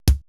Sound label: prc d5, Procussion Mix; Retro Sampler; EMU E-III